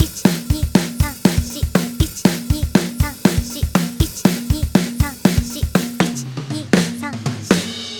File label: double time